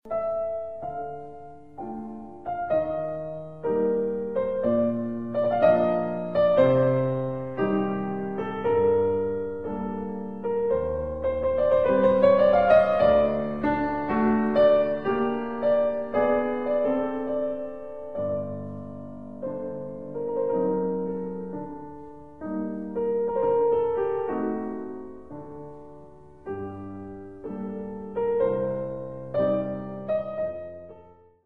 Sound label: nocturne; Source Engine